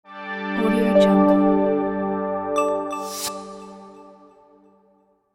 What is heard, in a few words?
calm logo reveal